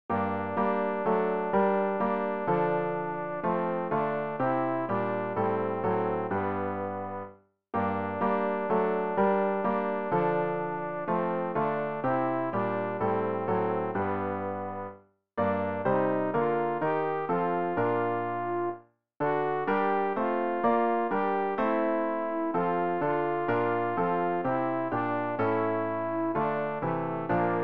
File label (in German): alt, Übehilfen für das Erlernen von Liedern; mit ernst o menschenkinder